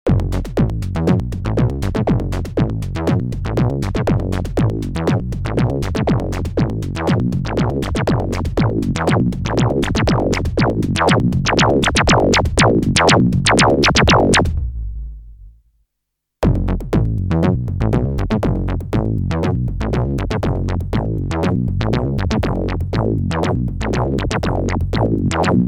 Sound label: Bridge Seq; REV2